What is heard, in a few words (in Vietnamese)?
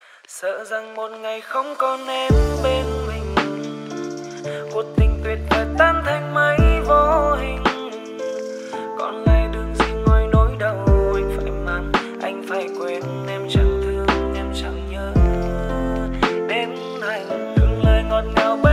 lofi ver